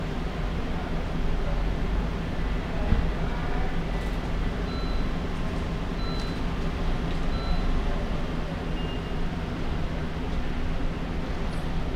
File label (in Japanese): 津駅 マイク